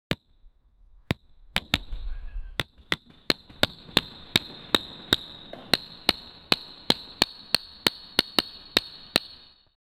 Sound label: outdoor court one basketb, basketball